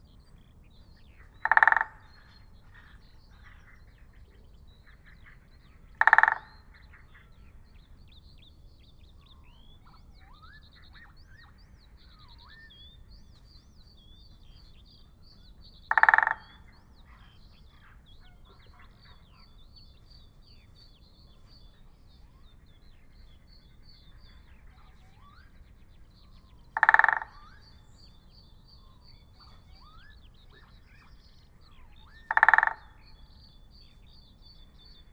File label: Great Spotted Woodpecker Dendrocopos major